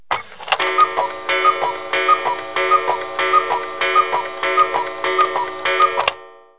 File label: cuckoo, house chimes; KABOOM! - Wild and Wacky Sound Software